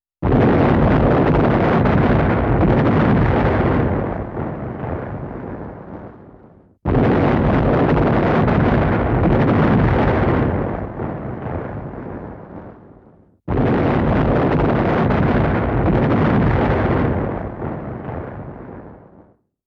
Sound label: Multiple Missiles and Bomb Explosions